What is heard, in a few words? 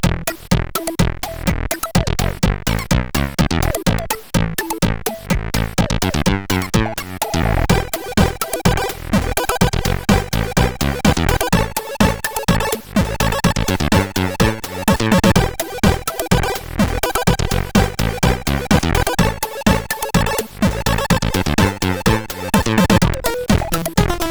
sid CSG8580; crankintro